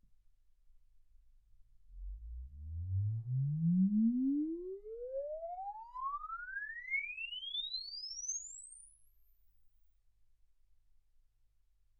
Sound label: exp swept sinus 10Hz 24kHz